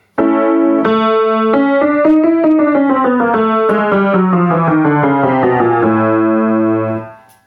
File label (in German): Ungestimmt